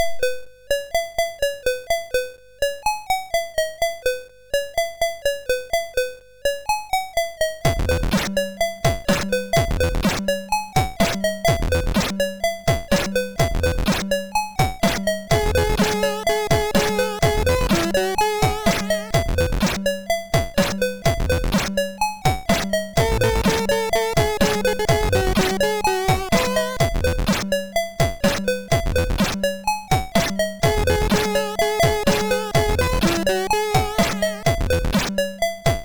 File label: Jingle Rock, MOS6581R2